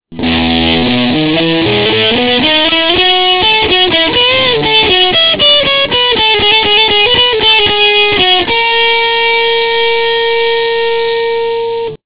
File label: guitar solo, guitar sessions